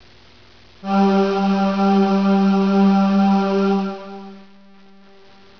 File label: ship whistle